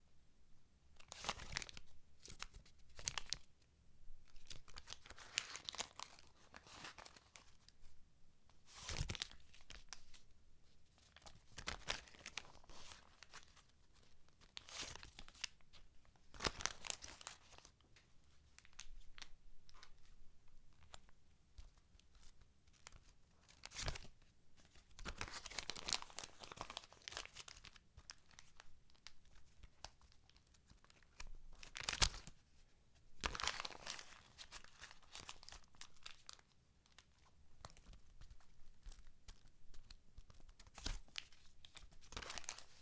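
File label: Money Out Of Wallet And Handling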